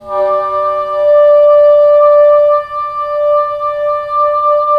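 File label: wind bassoon; LA Composer vol. 3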